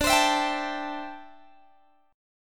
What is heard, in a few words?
D 9th Suspended 4th D down Guitar Open G